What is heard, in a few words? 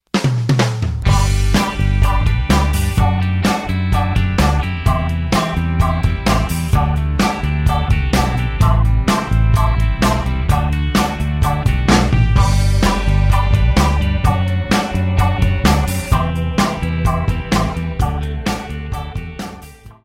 Oranges Alternative Instrumental